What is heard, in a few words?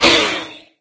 pain head solo; doom3